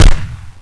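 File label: grenade launcher, weapons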